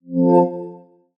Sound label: Unlock, ui; Amazon Fire